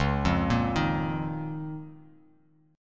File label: Db 6th Add 9th Db down; Db6add9 arpeggiated; JGuitar